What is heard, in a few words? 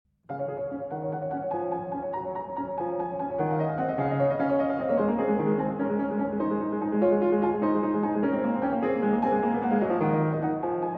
Prelude & Fugue in C-Sharp Major, BWV 848 I. Prelude